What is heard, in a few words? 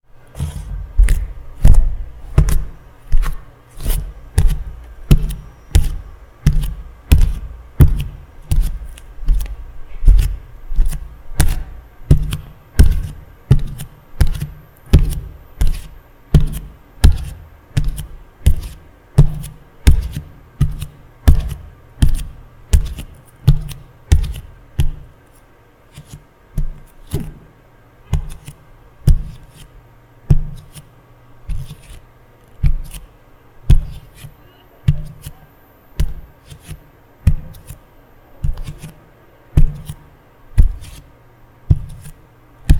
Footsteps; Ib Remake